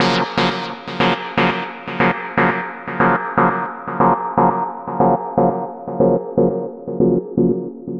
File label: 120 filter down echo stabs; SYNTH AND ELECTRONIC LOOPS